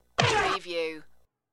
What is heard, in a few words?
Punch